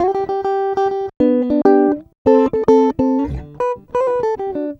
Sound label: Acoustic Guitar 100 bpm C, African Guitars; Quazibeat; African Secret